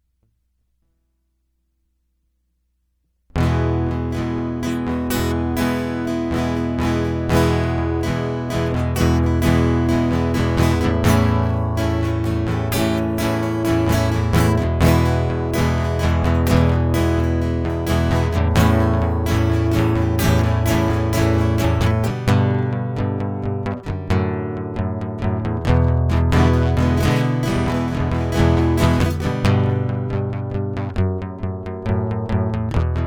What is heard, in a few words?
Guitar Loop